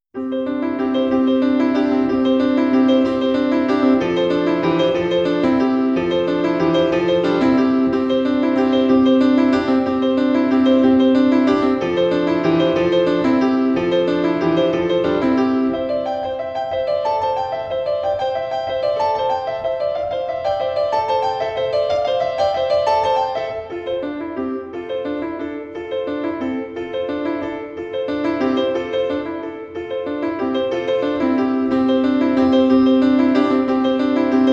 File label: piano solo; 1. sats fra Syv slaatter og impresjoner for piano solo